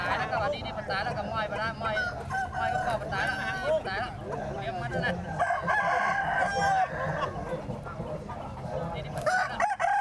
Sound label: Chiang Mai cockfight